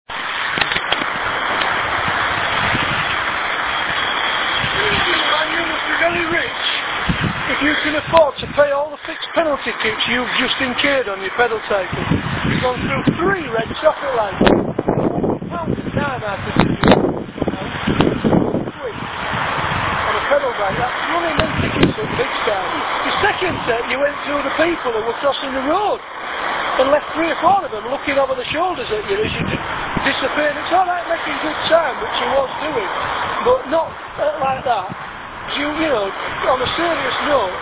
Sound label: uk police